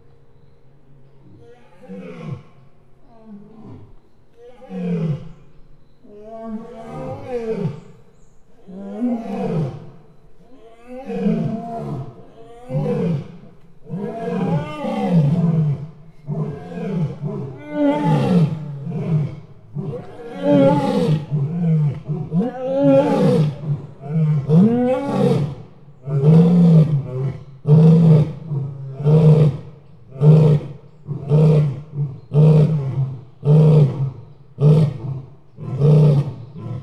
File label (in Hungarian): bömbölés, oroszlán; szegedi zoo 2011